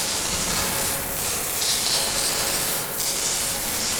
Noise Loop, sparse soundscape

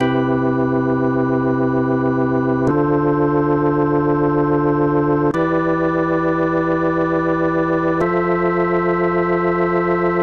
Progression, Organ, Loops; Sonic Foundry (Sony Creative Software); Joe Vitale Organ Donor; Progressions

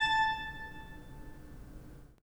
PIPE, 3DOrgans; E-MU Producer Series Vol. 5 – 3-D Audio Collection; 3D Pipes Dr Joyce

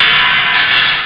hat open; jambala; Amiga Soundtracker Sample Packs

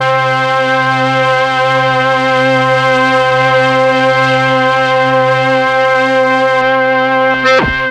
MEL STR, Legendary M400; ILIO; Propeller Island

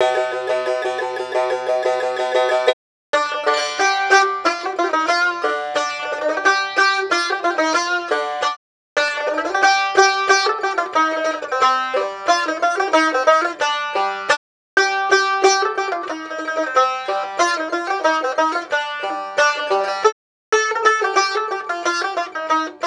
Jingle bells

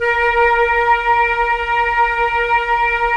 Flute, Roland LA Composer

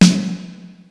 Snare, Percussion; Giga Samples Collection; ELQ-DrumQit; rock toms

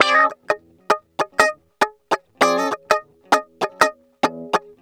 Guitar Loop; Ambient Grooves

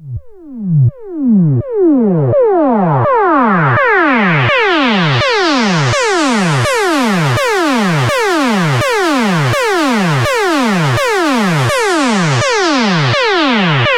synth arp; Electrocution

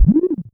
ASKING; Best Service Dream Experience; SF+EFFECTS

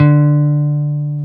Jazz Guitar D3; 90's Sample CDs; Club-50 - Foundations Roland; Jaz-Emperor

